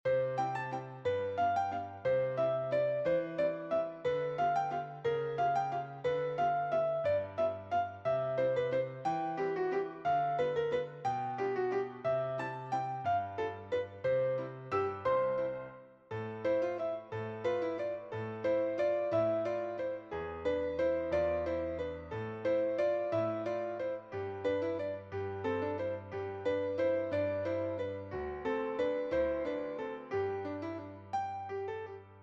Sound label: Waltz in C duet